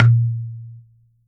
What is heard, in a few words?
coconut; Q Up Arts; Denny Jaeger Private Collection